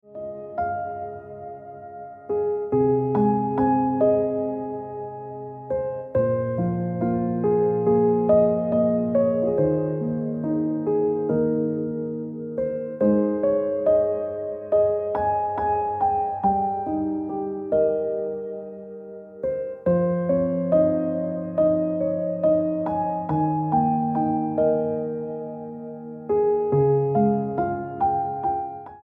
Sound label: Ringtone Piano Music